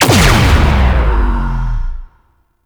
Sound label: Operator Beam Cast